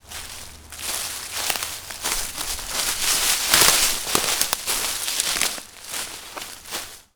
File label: Footfalls In Leaves; footsteps